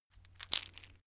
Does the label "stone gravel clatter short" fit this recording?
Yes